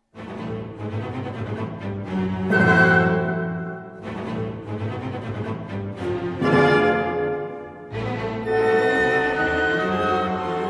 Concierto para oboe; Frigyes Hidas

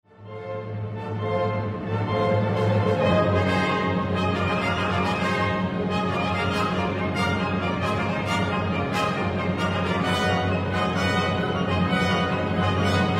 Sinfonia; No. 2 romantic Mata

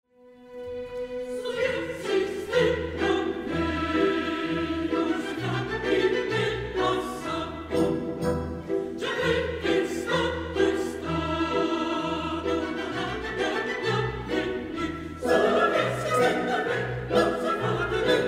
Preludio coro de las Gitanas y Matadores; de La Traviata; el coro en la opera

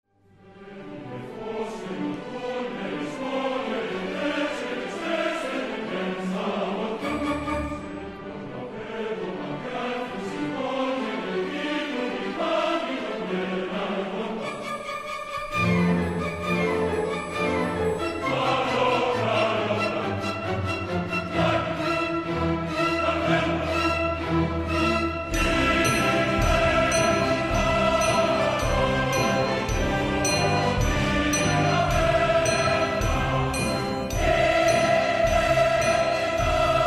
Coro de gitanos; de Il Trovatore; el coro en la opera

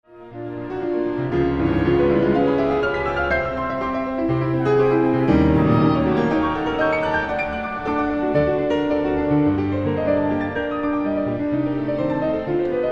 Concierto para piano; no. 2 Frédéric Chopin; gala chopin

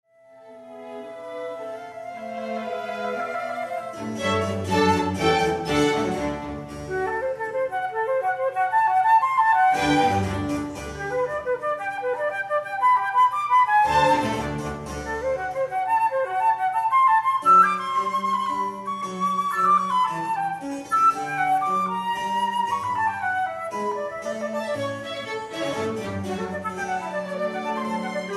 Concierto para flauta en Sol; C. P. E. Bach

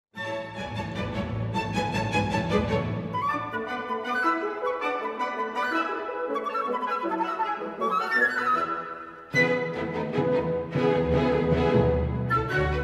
Sonata para flauta y piano con Orquestacion; el arte de la transcripcion

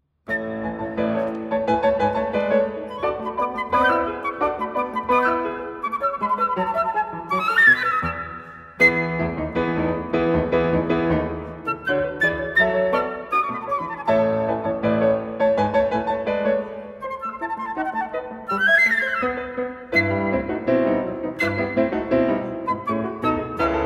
Sonata para flauta y piano; version original; el arte de la transcripcion

